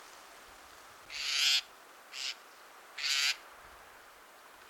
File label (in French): pie grieche grise